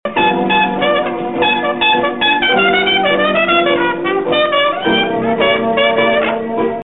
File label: V7 to I